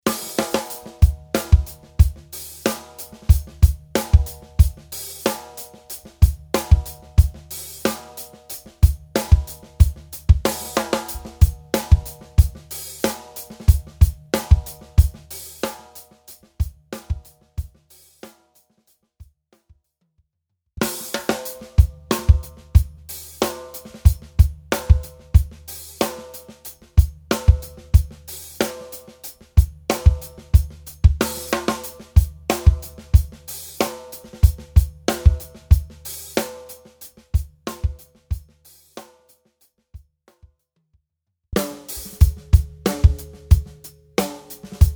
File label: Taye 7x13 studiomaple studiobirch